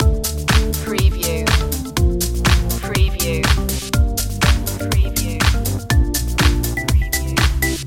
ibiza summer loop

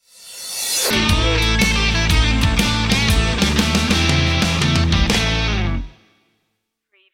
isolation blues stinger

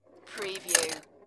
Pinball sound, ball roll past thin gate or lever

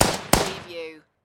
Machine Gun Vickers

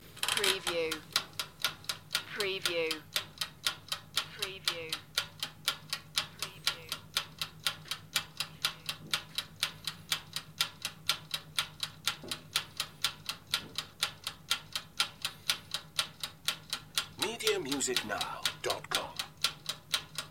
Timed Countdown

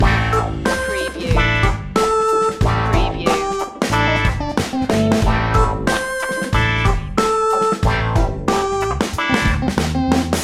funky punky loop

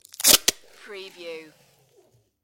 tape hard rip from tape dispenser

